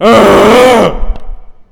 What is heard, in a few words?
pickup